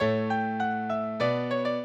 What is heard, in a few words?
minuet, mozart